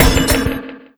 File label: Hit, Fx; Sonic Foundry (Sony Creative Software); Esoterik Beatz; Bello